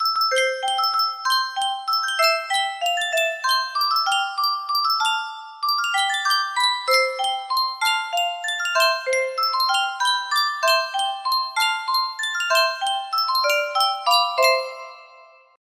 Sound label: Yunsheng music box lullaby, music box melody; brahms